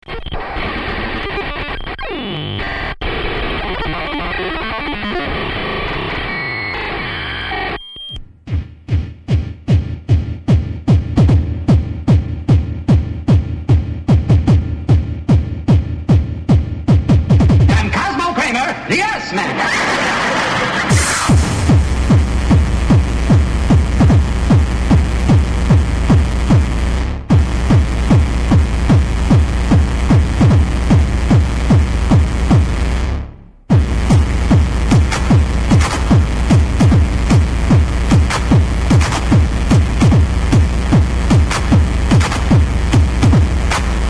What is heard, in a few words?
tekno